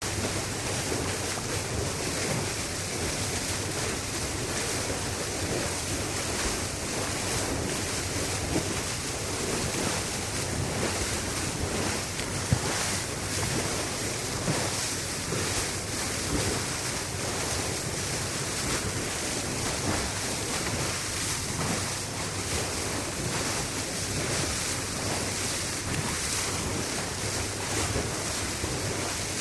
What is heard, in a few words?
Ferry Boat, Medium Speed with Bow And Wake Wash. Good Hull Impact.